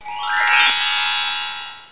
Piece Remove; senet

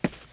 SFT STEP; ageofwonder

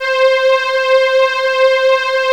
Synth Strings; GMTones; Ensemble

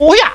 heeya; Realmz 6.0.3; Prelude To Pestilence

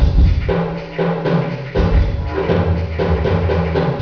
drums; Rocky's drums

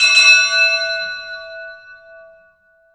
2 BELL; Alexander

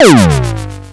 Laser Miss; Kongbots 1.0; Robots; Strategy

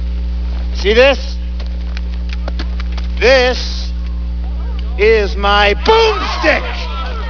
boomstic; The Complete Doom Accessory Pack Volume I

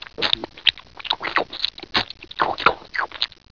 EAT

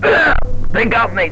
man dying far; Bolo

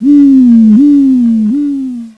Rotor Hum; Swoop

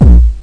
dist, bdrum samples; less hi+mid

